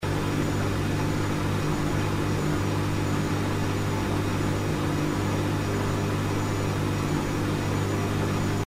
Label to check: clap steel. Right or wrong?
Wrong